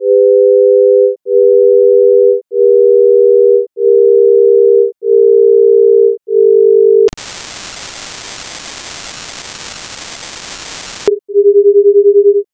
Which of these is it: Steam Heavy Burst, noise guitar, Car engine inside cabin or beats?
beats